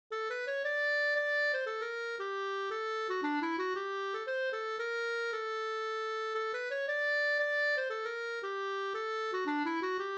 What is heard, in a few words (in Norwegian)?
pols; Storjohannesleken no